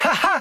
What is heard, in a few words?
haha